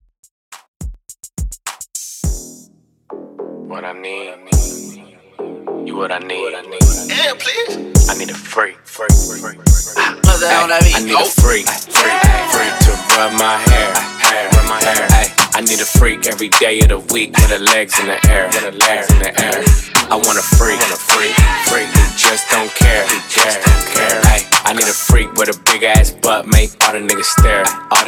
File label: HipHop 105 BPM